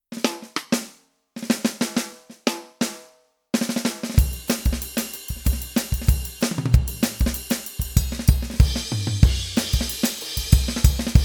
G9 Acid Jazz

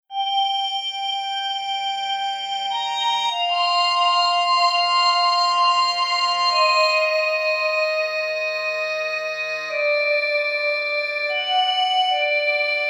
iP Glass Organ Delay

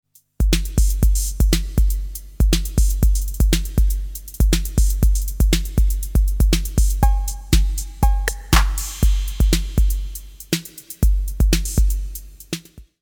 808 back wall echo

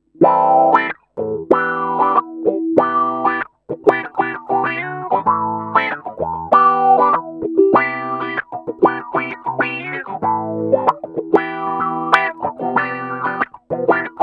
Envelope struming

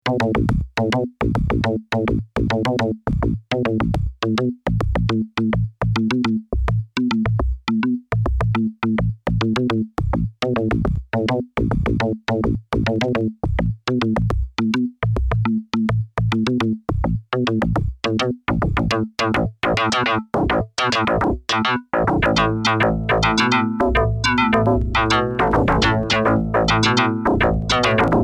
Bass

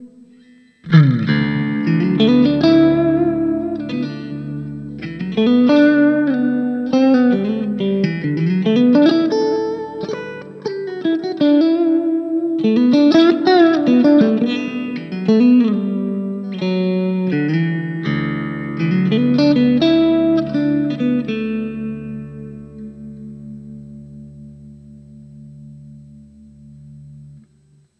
Clean

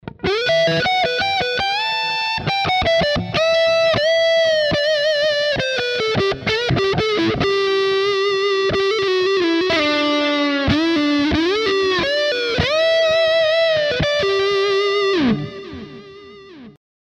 Framus Diablo Pro Neck Humbucker Highgain Lead

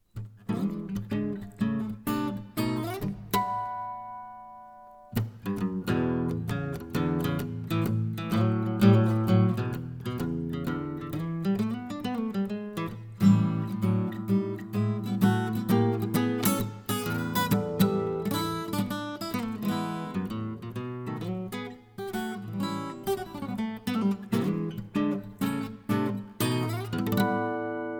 Guitar

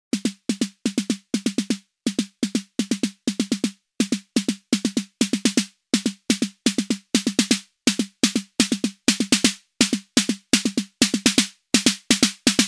RD-6 Snare Accent

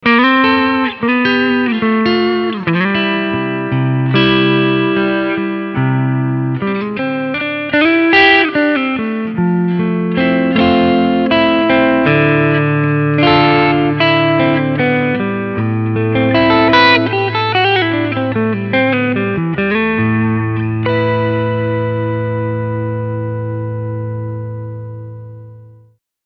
Front HB Clean

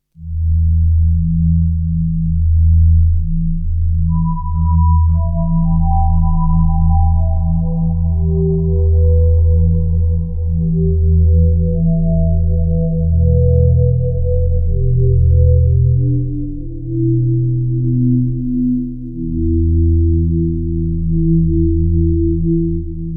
Blue Wave Pad

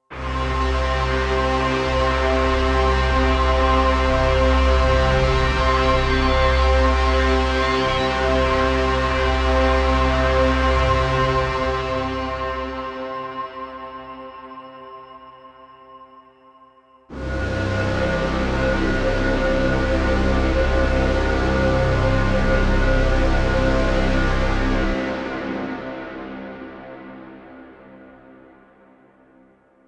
Elysion 2 SIRIUS PAD; Celestial Menace

